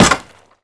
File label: parry; Immortal